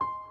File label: piano high